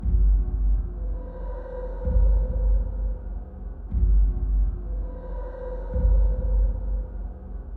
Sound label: Mood Tension Light